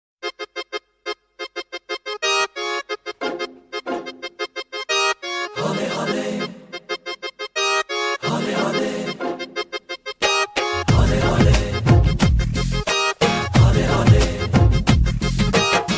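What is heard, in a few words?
instrumental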